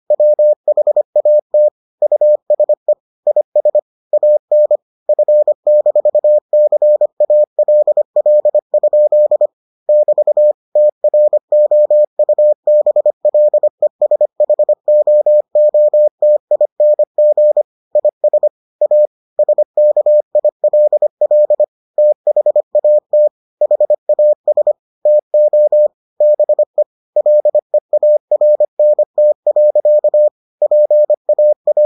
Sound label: morse